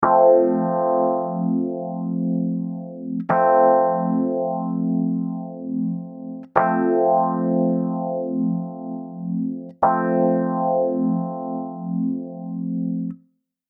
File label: Chandler TG 1 Keys THD